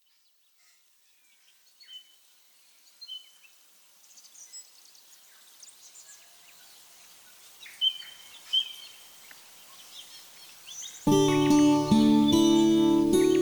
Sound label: ambiance male; smorgasbord